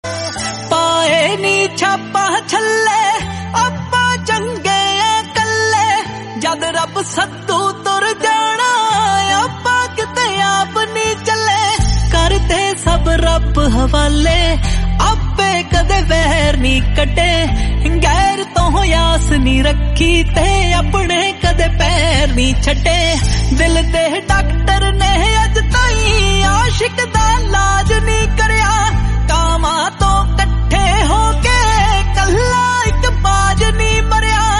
PUNJABI RINGTONE

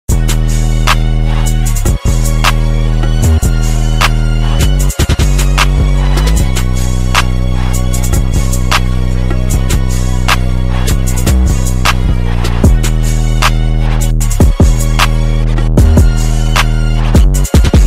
beat ringtone

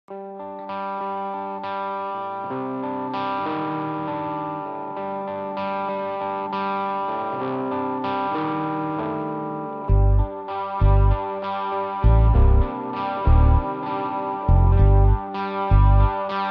ALTERNATIVE ROCK TYPE BEAT RINGTONE

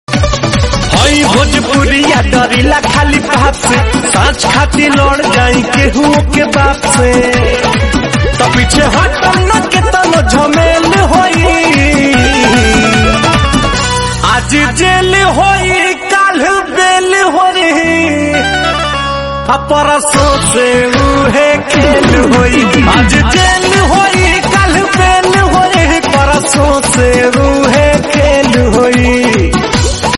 Bhojpuri ringtone

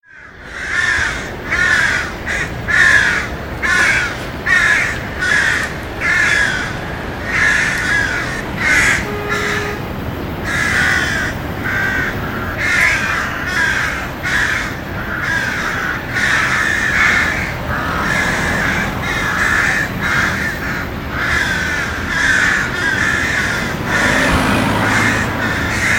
CROWS IN THE MORNING SOUND

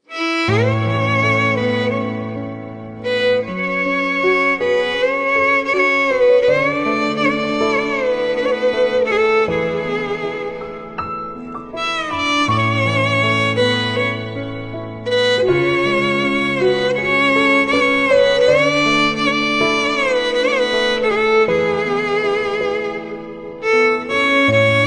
VIOLIN VERSION RINGTONE

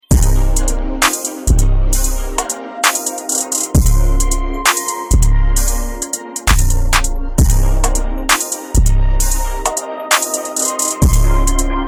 INSTRUMENTAL RINGTONE

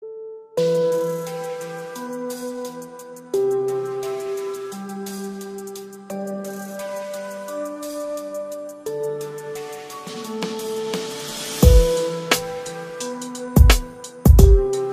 instrumental ringtone

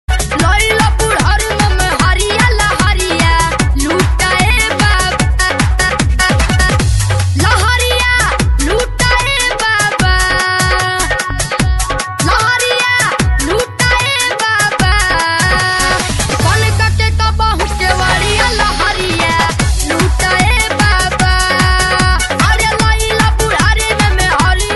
BHOJPURI RINGTONE

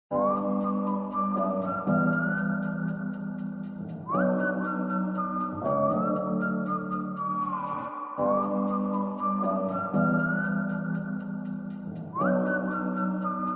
whistle ringtone

